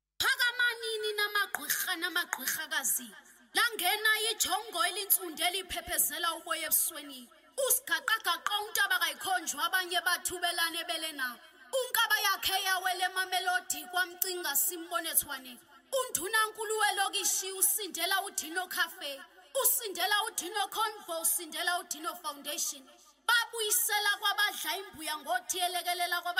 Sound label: Amapiano Mix